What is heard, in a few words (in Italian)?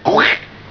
anatra, versi